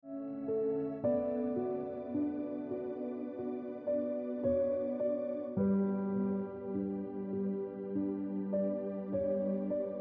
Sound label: Look Back On Ringtone Piano Music